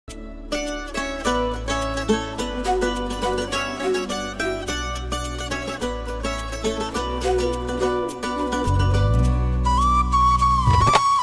bansuri ringtone flute ringtone

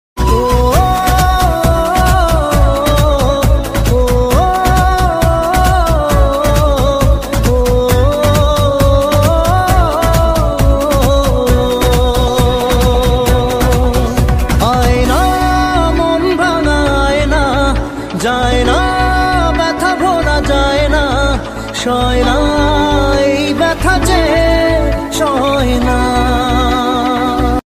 Bangla ringtone

